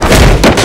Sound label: Body Smash, Slapstick